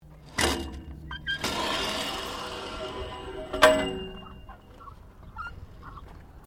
PLAYGROUND FLYING FOX TRACK SHORT; Various playgorund equipment; Playgrounds (Sound Effects:Backgrounds)

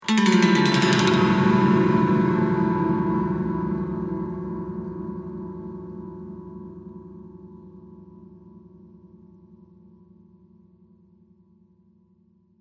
Spooky Strings, Ghostly piano strings transition.; Sound Design

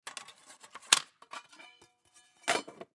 Film Reel 16mm, Film Reel 16mm Put In Case; Film Set; Technology; Blastwave

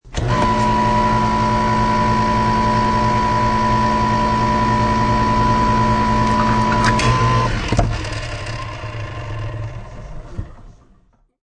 Can opener working.; Kitchen; Household

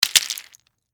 Debris Mixed Nuts, Pile; Texture; Debris Sound Effects; Materials

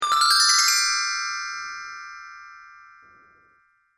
Sparkle Chimes Up, chime sound effects; multimedia

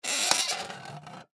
Film Light Arri Halogen Close Gates; Miscellaneous Electronic Sound Effects; Foley; Blastwave

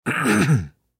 Human Cough; Clear Throat Short Male Fifty Yrs; Human Clear Throat Short Male Fifty Year Old; Clearing Throat

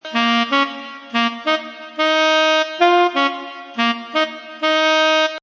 Jazz saxophone loop in D# minor at 180 bpm.; Jazz Saxophone Loop Riff 1 D#m 180 bpm; Brass Loops

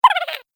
Funny Little Gremlin, monster

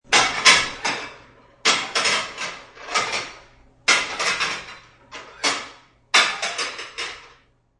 Stacking plates; Kitchen; Household

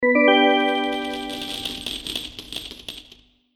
Bonus Gold Coins, video game

